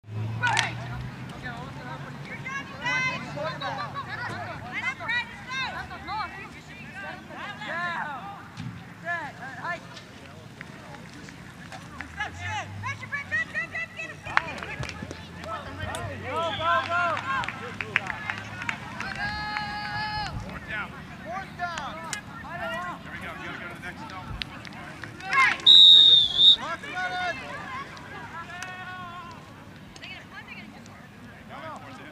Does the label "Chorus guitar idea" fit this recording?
No